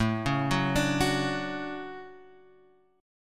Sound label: D Major 7th, chord